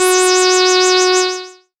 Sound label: fx F#, Analogue FX, Dance Synths